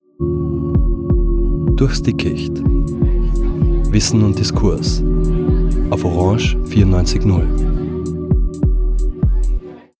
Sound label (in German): spartenjingle; durchs dickicht; orange